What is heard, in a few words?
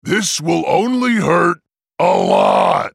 attack; Trundle